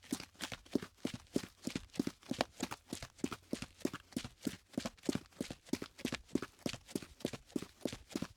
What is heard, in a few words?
Footsteps Boots; LOOPERS